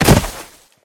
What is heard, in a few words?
grass fall; Golden Crayon Sounds